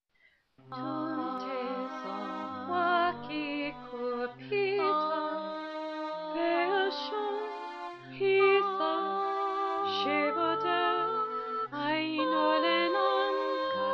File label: lullabye accompanied; carpathian chanting